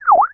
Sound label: cancel